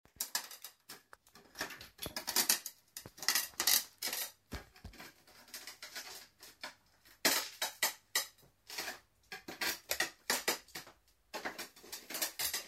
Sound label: Field Recording; Torn Tracks